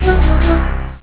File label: message recv; gabber; BeOS